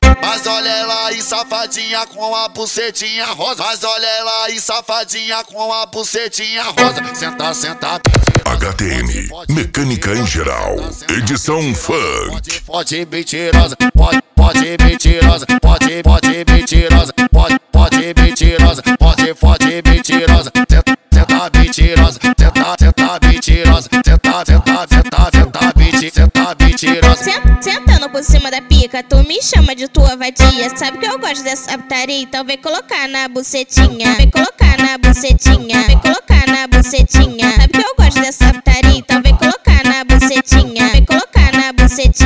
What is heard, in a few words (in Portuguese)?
mecanica em geral edicao funk